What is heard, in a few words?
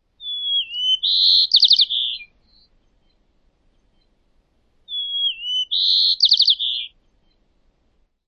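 white-crowned sparrow songs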